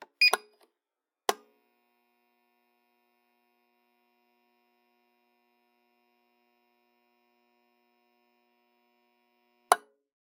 Electric power surge protector turned on with beep and loud relay clicks, pause, relay clicks; with buzz